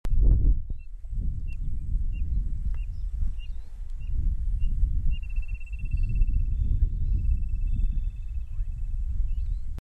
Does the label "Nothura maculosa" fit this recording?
Yes